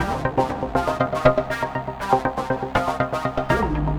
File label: Bringin It Up, Synth Sequences